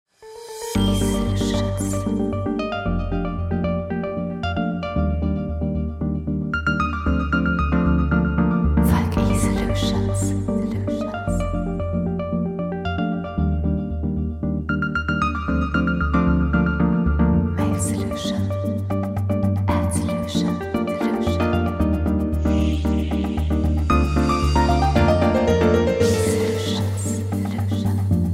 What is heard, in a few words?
Jingle; Falk eSolutions